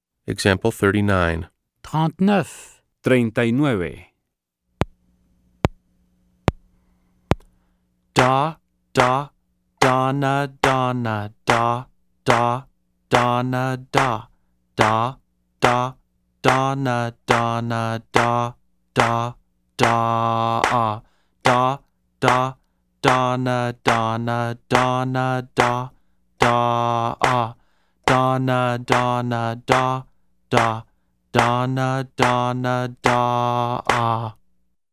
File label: Keyboard